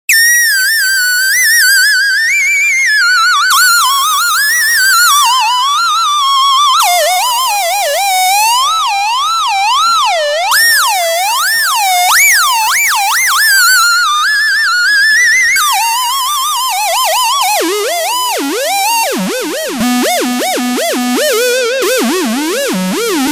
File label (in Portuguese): sirene de invasao